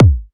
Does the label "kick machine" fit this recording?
Yes